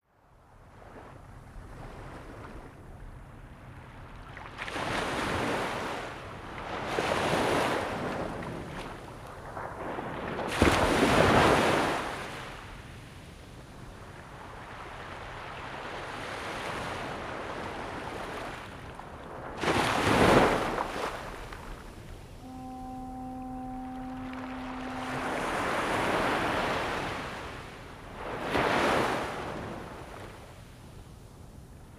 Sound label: Shore Amb Waves Lappi